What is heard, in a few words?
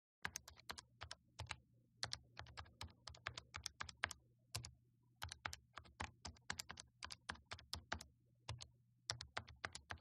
calculator hq sound effect